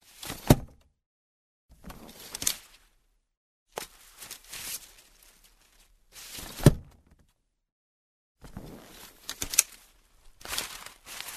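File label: opening closing an umbrella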